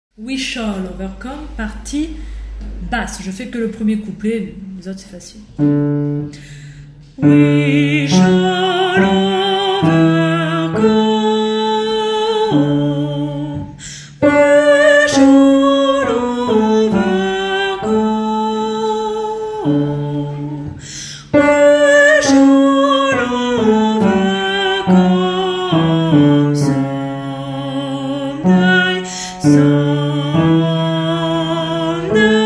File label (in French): basse; We shall overcome